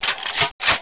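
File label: grenade launcher reload; cstrike; codmw2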